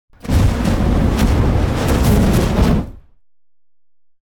flamethrower fire, WEAPONS FLAMETHROWER Long Heavy Single; SmartSound FX